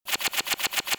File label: Laser machine gun